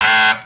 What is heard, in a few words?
time is up; Zaps and Beeps